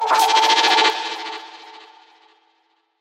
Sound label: Wooshy Transition